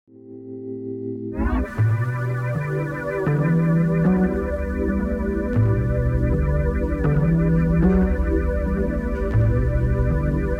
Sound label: Organ with Fire; B3